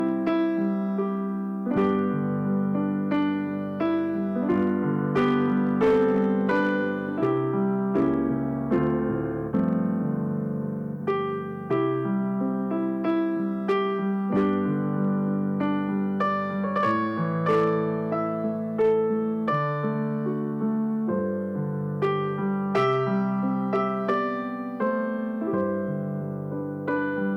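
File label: American The Beautiful Instrumental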